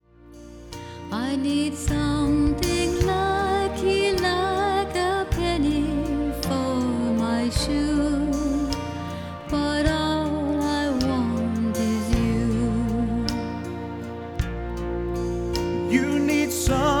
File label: Wedding Duet